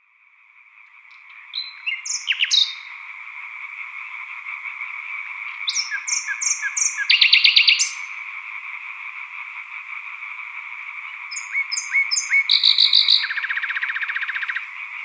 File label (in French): solo de rossignol choeur de rainettes vertes foulques; Sattenay